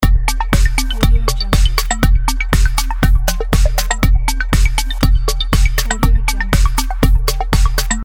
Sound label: dance countdown